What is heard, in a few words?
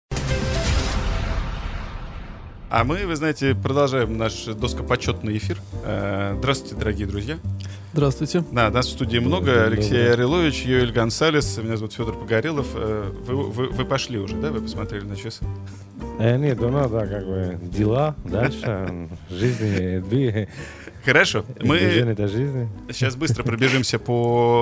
Music